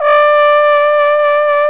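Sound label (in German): POSAUNE VIBRATO; Best Service; Alpine Volksmusik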